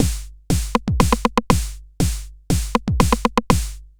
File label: Rim